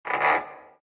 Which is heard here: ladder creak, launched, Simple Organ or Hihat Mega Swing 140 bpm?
ladder creak